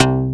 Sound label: DIGBASS